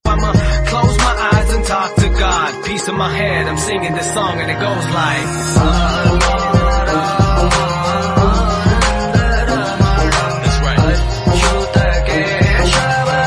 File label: Bhakti Ringtone